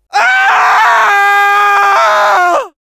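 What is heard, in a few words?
Scout pain critical death fr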